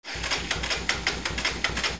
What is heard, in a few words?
VEHICLES